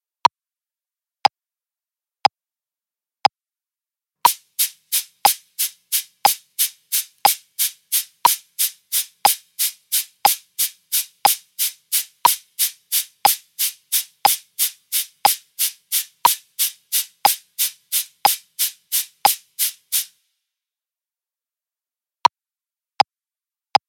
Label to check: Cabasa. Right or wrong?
Right